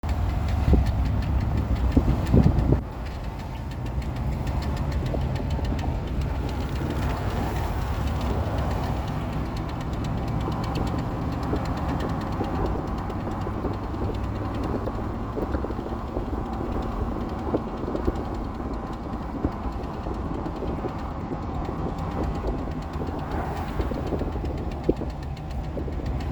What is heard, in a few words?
flapping sounds issue; 4th gen ram